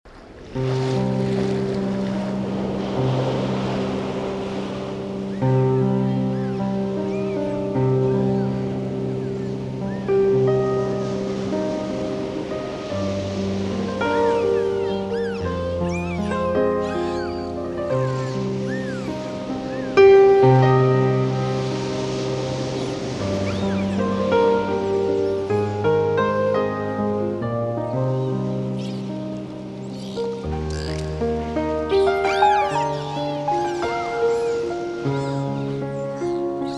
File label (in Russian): Chayki zvuki prirody